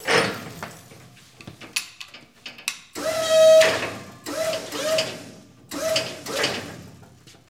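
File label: Forklift Electric Movement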